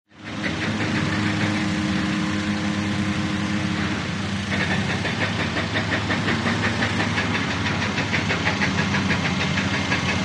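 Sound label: Distant Pneumatic Hammer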